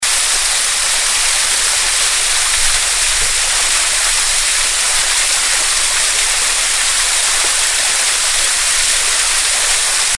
Falls; Blackledge Falls

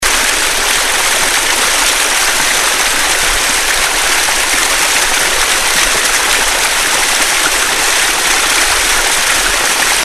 Mount Carmel Spring Falls

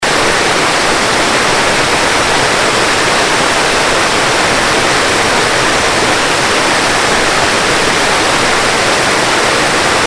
Deans Ravine Falls

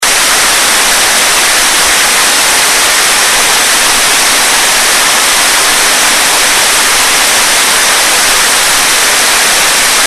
Gorge Cascade, waterfalls

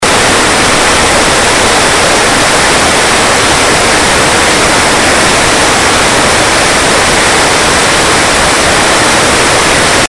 Great Falls

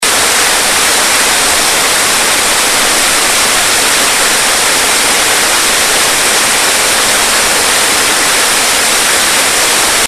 Buttermilk Falls Plymouth

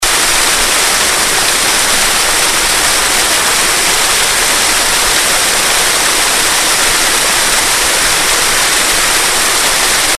Sperry Falls

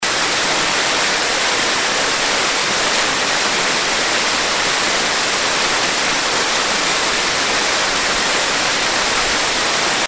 Burr Falls

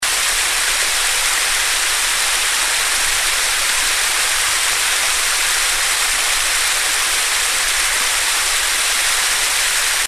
Cascades, waterfalls

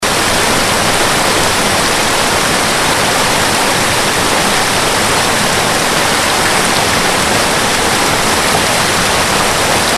Seven Falls

